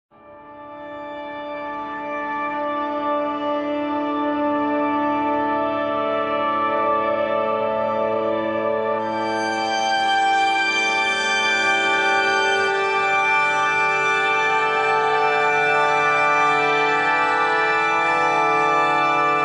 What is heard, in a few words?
Harmonious Cello - Amin 145 bpm; MIDI Clips; Tonal; Atmospheric; Ableton; Factory Packs; Mood Reel